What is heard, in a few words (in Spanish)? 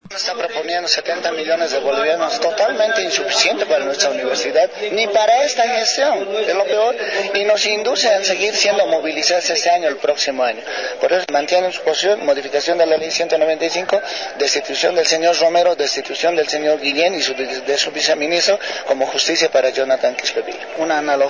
Marcha